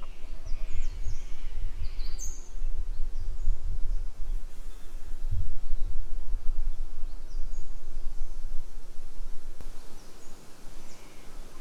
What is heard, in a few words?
cicadas, Rainforest